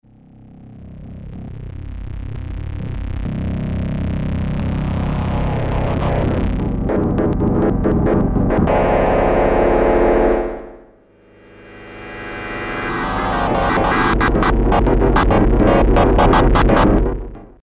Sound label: Gurgle, Yamaha TX81Z